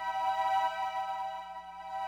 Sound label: angel, ambience, hongkong; Soldier Of Fortune II Double Helix; XBOX